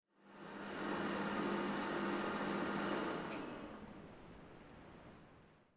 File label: PC Operating Noise Far Forced Shutdown